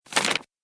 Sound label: Turning Paper Pages; Paper Sound Effects; office